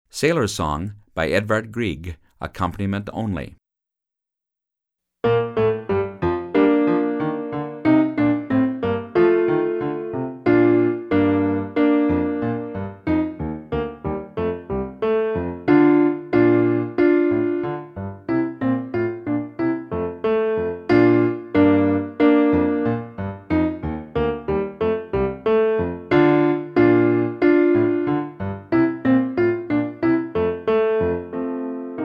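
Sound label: Sailors Song accompaniment, band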